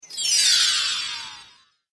Santa Snowflake Spell, magic

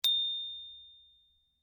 XYLOPHONE SMALL, Tiny toy xylophone; Mallets; Musical; Foundation4

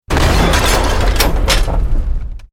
EXPLOSION MEDIUM METAL GLASS

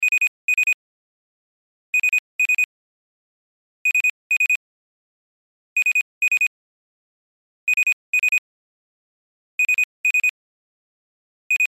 Pager Beeps Alarm Loop; Multimedia; Technology; Blastwave

